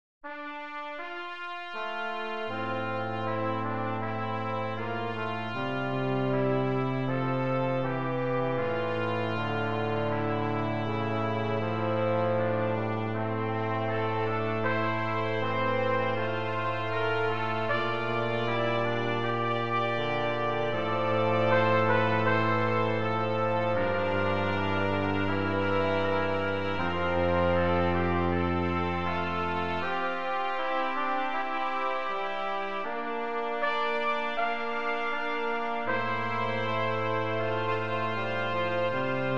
magnificat pour trompettes trombone tuba; Dieterich Buxtehude

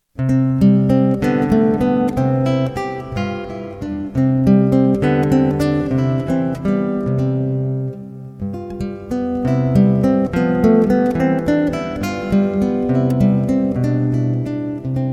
valse

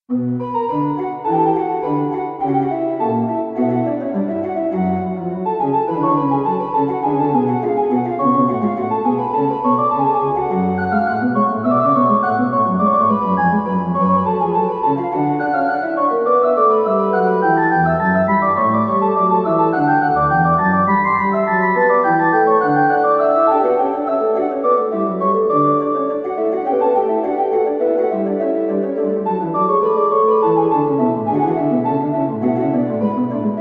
inventions a 2 voix orgue; bach johann sebastian; 15 inventions

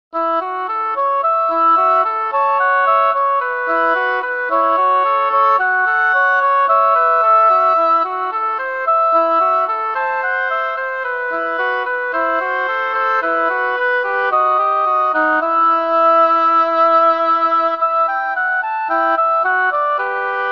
petit duo en mi pour hautbois

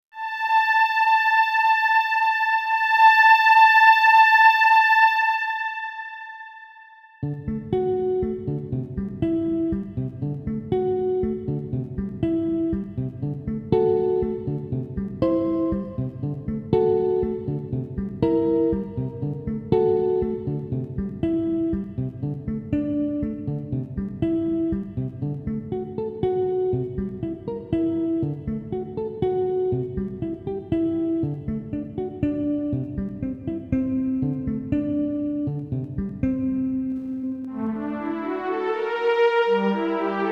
pour alto solo

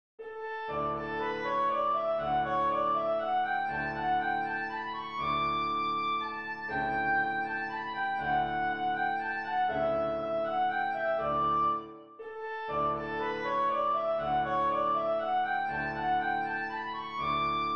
danse allemande; beethoven ludwig van

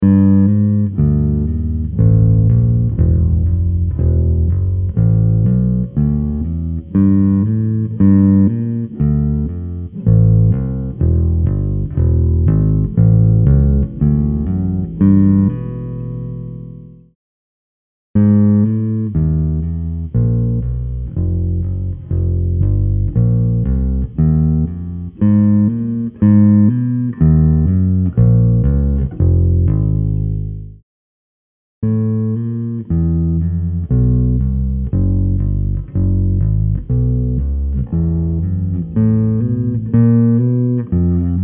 pour basse; moreau alain; hammer

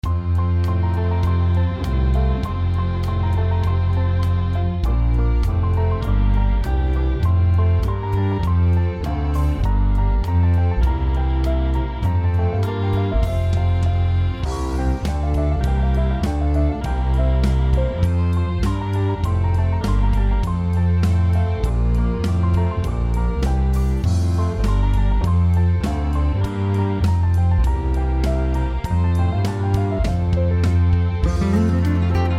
clavier

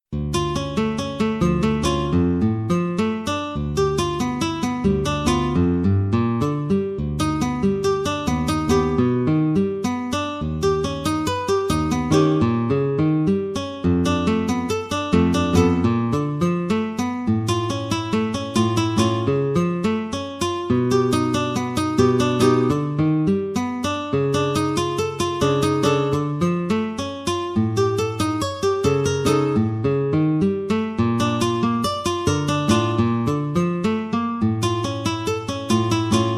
pour guitare

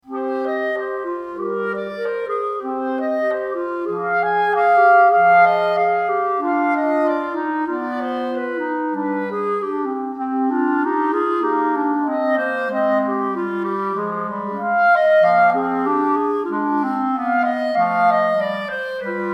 trio pour clarinettes; vignon denys

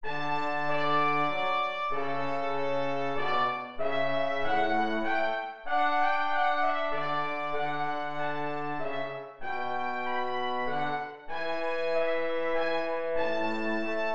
menuet majeur; purcell henry

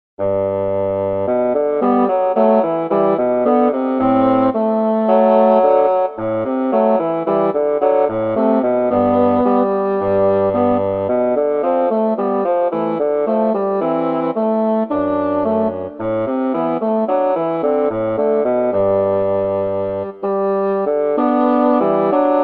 petit duo en sol pour bassons

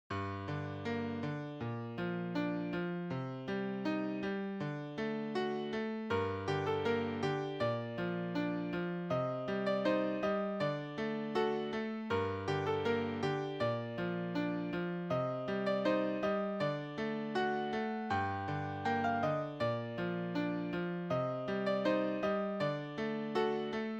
melodie

